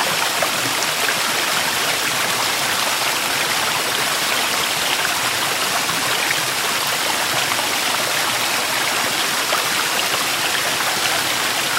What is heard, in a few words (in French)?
cascade, relax